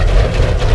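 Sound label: water loop short, ships engine; Quantum Redshift; XBOX; kukai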